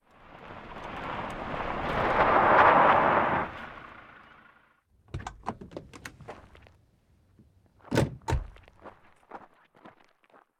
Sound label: Car stop, get out, walk; the 39 steps